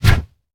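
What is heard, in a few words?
attack bat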